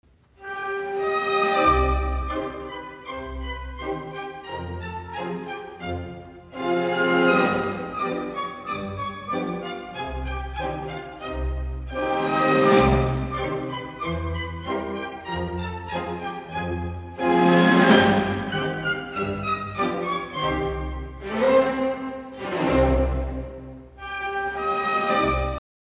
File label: Esmeralda Female Variation